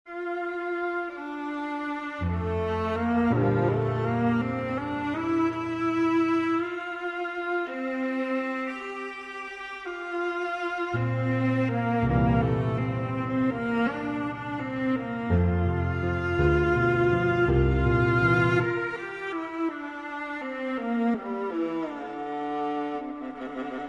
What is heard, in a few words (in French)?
Nocturne pour alto et piano